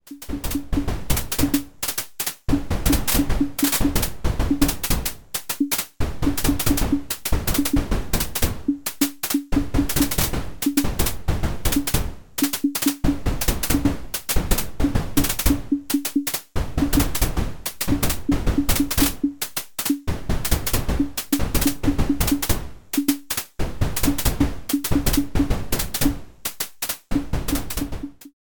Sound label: Percussion Sequencer Multi FX; Pittsburgh Modular Lifeforms